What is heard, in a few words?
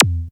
EFEX, FX DRUM